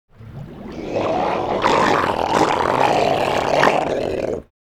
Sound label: seal harbor snarl gro; juru gamta